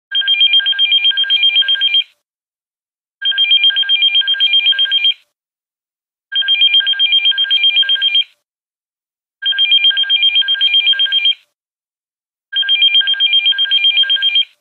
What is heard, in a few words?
ring; Orphan Black